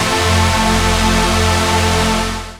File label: chord A; Techno Trance Essentials; CHORDS